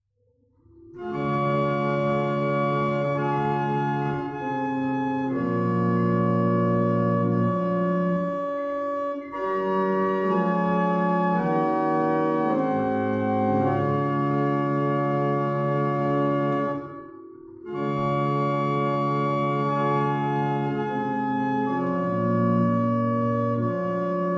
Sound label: Single chant, barrel organs; Isle; Battishill